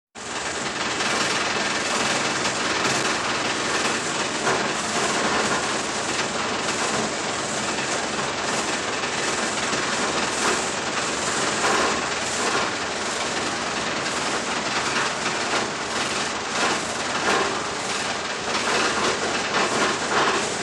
SHinkovka

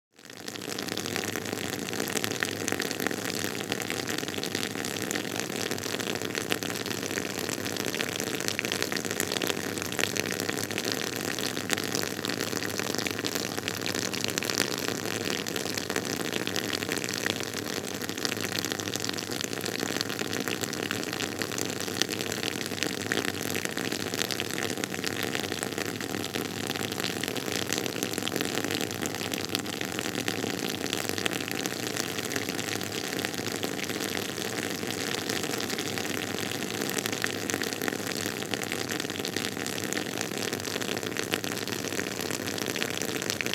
Struya

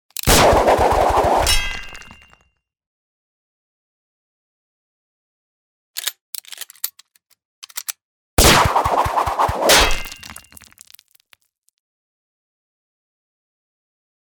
Metatelnaya koshka

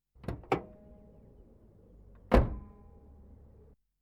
Kapot

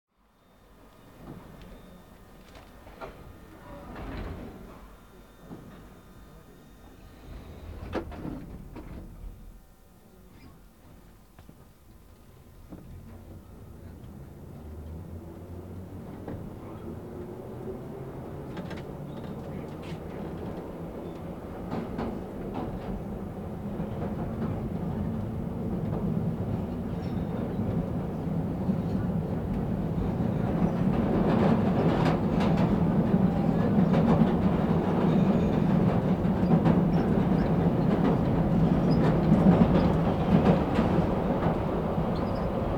V vagone metro

